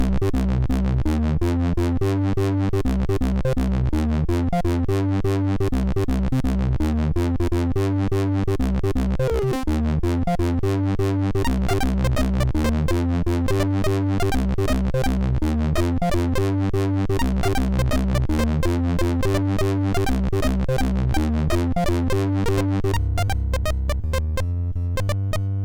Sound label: loadertunes, sid MOS6581R3; Vicious SID 2